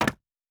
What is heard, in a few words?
Wood